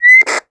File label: Walkie Talkie Feedback; Communications